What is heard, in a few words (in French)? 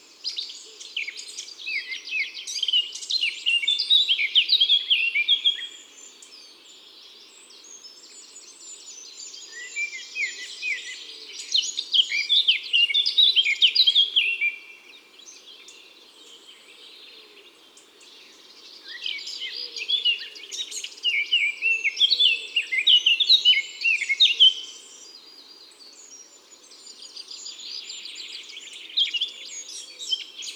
Fauvette a tete noire Chants d'oiseaux des bois et des forets